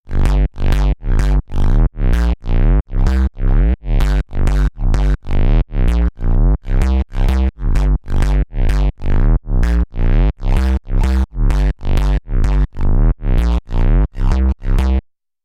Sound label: house